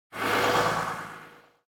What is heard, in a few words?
Truck jump